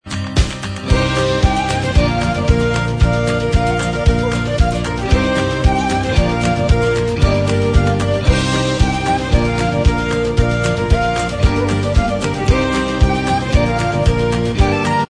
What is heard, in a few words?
Inst